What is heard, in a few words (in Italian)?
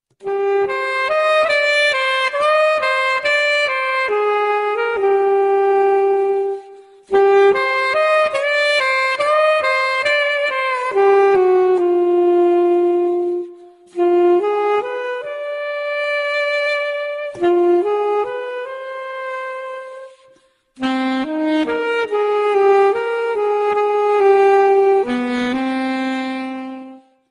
Piano